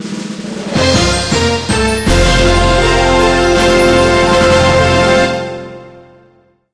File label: champions jingle loud; Effect result